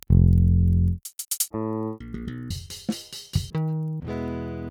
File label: Pultec; Virtual Analog